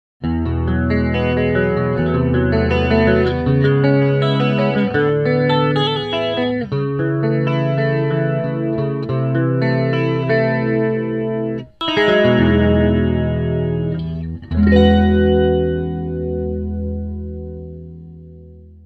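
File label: Chorus, Transamp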